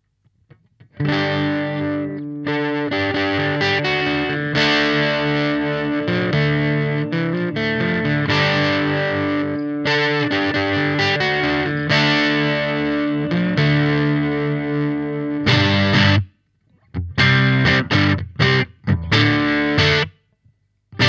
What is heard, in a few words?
Triode crunch; lampes